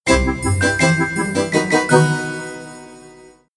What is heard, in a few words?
(Jingle) Try Again; rayman 2 remastered soundtrack